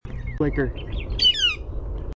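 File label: Northern Flicker peah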